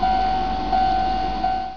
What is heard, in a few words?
train